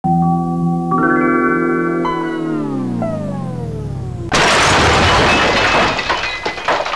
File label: win95 crash